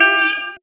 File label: dwheew; Truth-Hz - 1-Hit KO Sample Pack